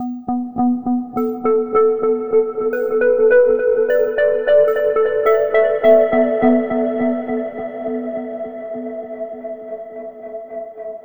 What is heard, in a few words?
Gridnode Anchor Overlay Tone